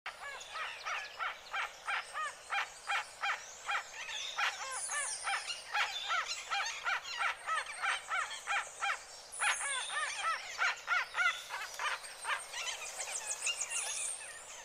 Pava De Monte O Pava Oscura Cantando Sonido Para Llamar; Pava aliblanca; Lambayeque

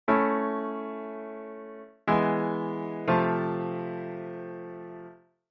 Rota V A B Vi 3 A, cadencia; auditivo de cadencias autenticas plagales rotas y semicadencias; Identificar la cadencia

